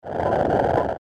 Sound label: Space Whoosh